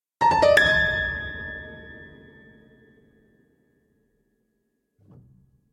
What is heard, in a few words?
piano notes; SyrinScape; Madness in the library